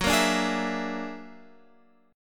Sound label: Gb Augmented 9th Gb down Guitar Open F; Gb+9 strummed